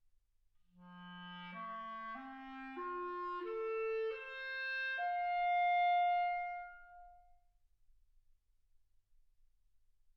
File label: clarinet